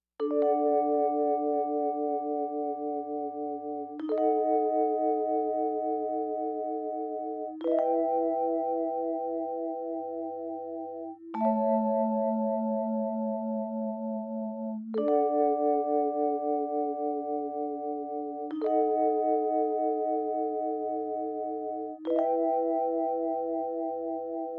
Mellotron Vibes

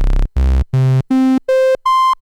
Tri, Oscillator; unosynth

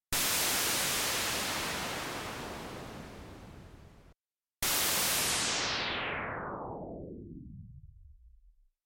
Filter Slope

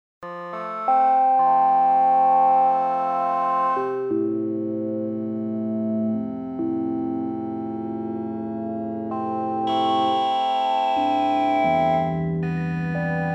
Pad; deepmind12; Changfen

